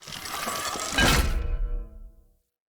Pollution Tower Face Open; Encounters

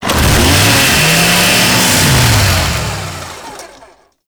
Saw Ride Start; Warframe; Kuva Ghoul Saw

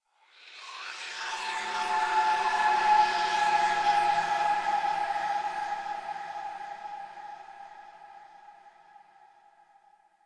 SFX Consume; Warframe; The War Within; Mountain Pass Return Peak; Cinematics

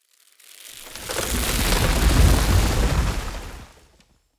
Root Door Open A; Neathuns

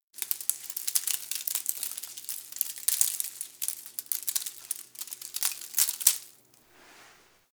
Derelict leave drop; Interior; Ambience; Warframe; Orokin Derelict

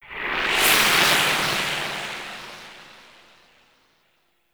Harvester Player Warning FX; Alad V; Corpus

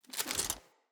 Battle Dog Foley Land; Snc Battle Dog

